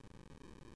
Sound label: rollover; SpongeBob Diner Dash